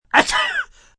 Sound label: Sneeze Breathy, Sneeze, male, short, classic, cold, sick, (human voice); Sneezing; VoiceBox